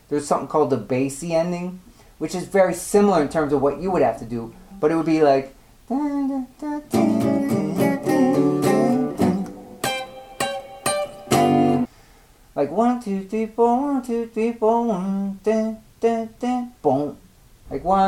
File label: common endings